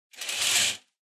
Curtain

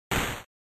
NES Shooter Damage

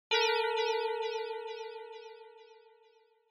Negative Timbre Delay; Onoma

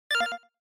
SNES Racing Select

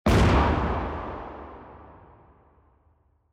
Cannon

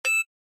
Cyber

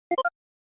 SNES Fighting Item

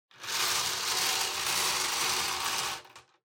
Bingo Machine Spin

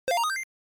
NES Action Item

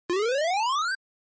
Warp, NES RPG

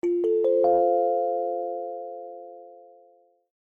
Chime Announce

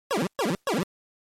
NES Action Enter

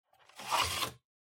Bingo Machine Master Board Eject

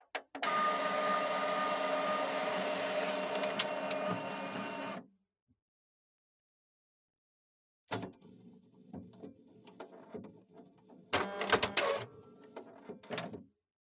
Printer

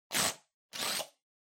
Blowout Close

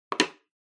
Sensu Put Wood

